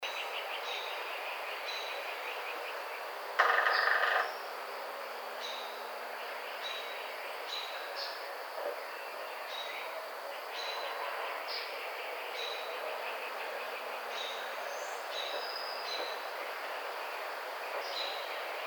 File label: Red-bellied Woodpecker